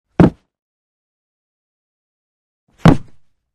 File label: Body Fall